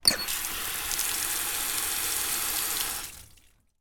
household tap outside on water run onto hard ground off